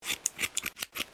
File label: haircut